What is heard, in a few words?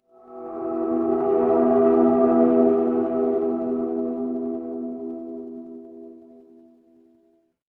horror drone